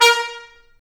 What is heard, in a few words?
BRS TP, Roland, Stabs; Roland L-CDX-03